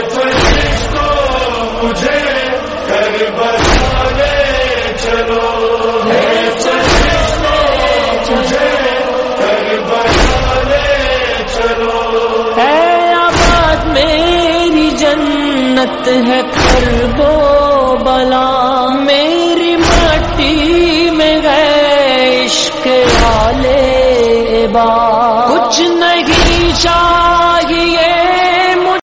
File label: noha karbala ring ringtone